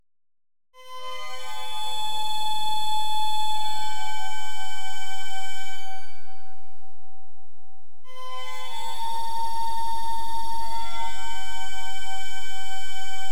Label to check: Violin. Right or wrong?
Right